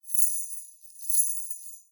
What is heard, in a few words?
sleigh bells